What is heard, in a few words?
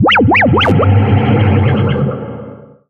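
Danger Sci Fi Button Press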